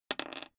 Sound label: die roll table